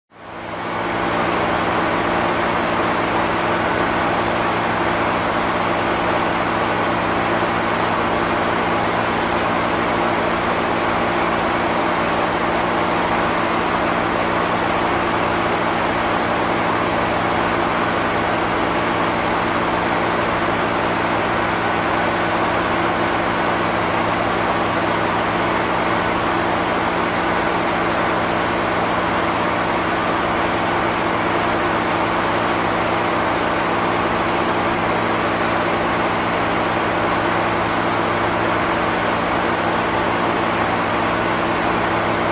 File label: Generator Boiler Room Ambience